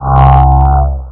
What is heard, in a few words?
zap; AirTaxi